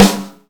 soft hitclap; osu

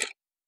clock; osu

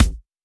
kick; osu

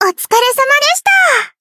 applause

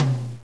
TOM

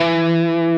Left hand vibrato

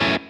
F#m7 Staccato

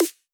snare a#4

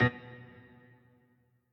piano riff intro

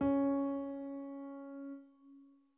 piano high

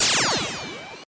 laser impact

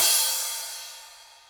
cymbal

